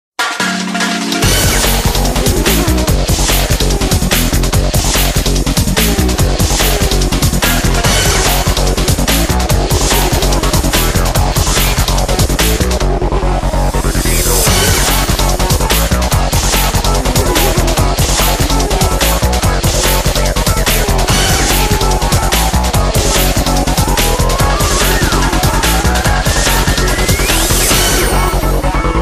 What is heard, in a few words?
slot car music